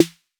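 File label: 808 snare drum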